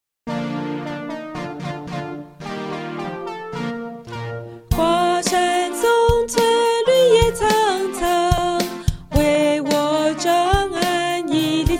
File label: sch song